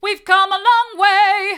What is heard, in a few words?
we've come along way hard, vocal